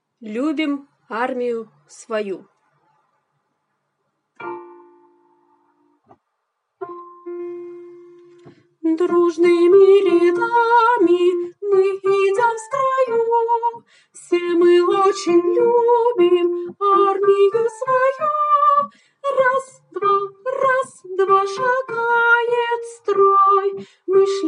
khor golos

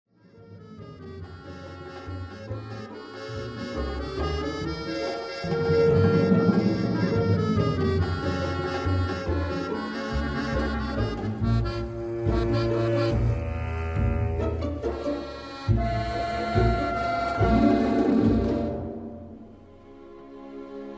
aktsent trevozhnyi; zvuki k osennim prazdnikam; zvukovoye soprovozhdeniye

